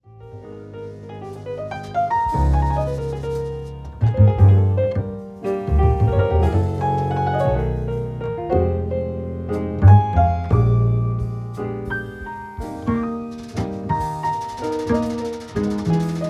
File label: jazz piano